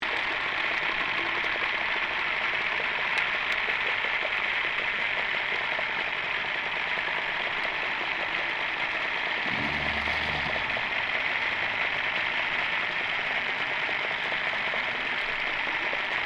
Boat Motor Outboard Johnson55 Move Off Slow Submerged